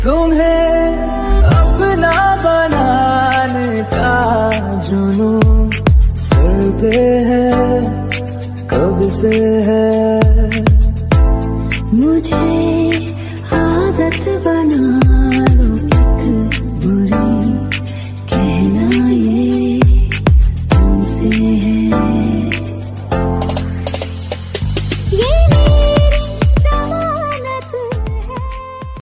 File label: love sweet ringtone, bollywood ringtones